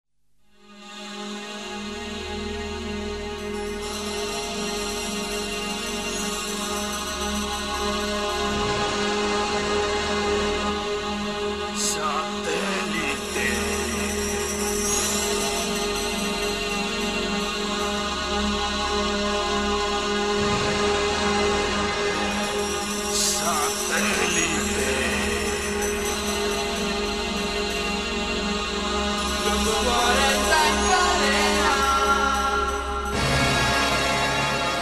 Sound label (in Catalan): Jingles, via satèl·lit; 40 Principales